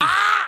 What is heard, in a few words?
growl, Voice